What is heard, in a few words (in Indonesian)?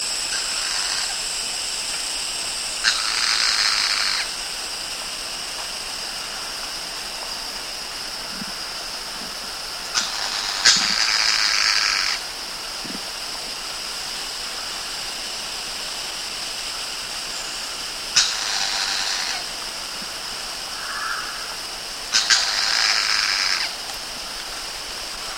madi hijau whitehead